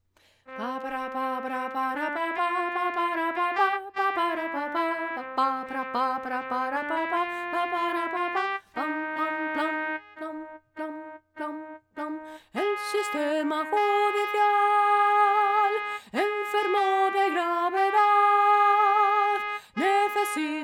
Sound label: alto